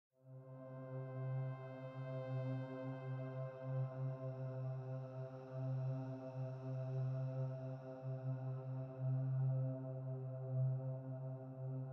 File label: Desert Music C4